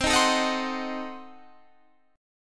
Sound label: C Diminished 7th C down Guitar Standard; strummed; JGuitar